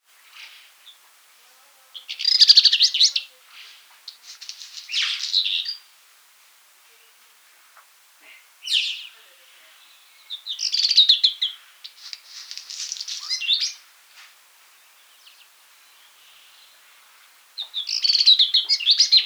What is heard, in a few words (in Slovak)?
žltochvost domový